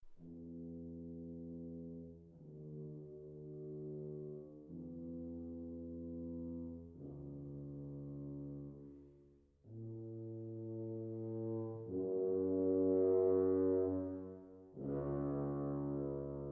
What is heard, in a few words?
tuba